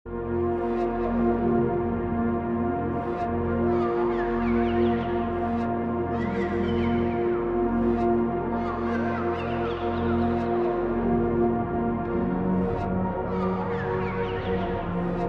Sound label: synth